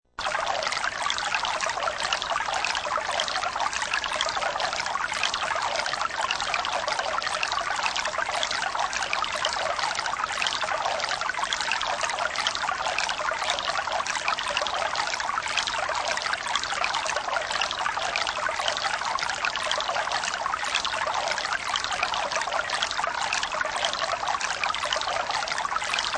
Stream Medium, Sound of a medium pitched running stream; River Sound Effects; Rivers (Sound Effects:Nature)